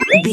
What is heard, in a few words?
cartoon boing jump